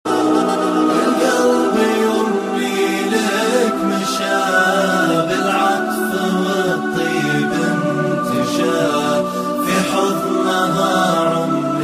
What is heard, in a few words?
Beautiful Islamic Ringtone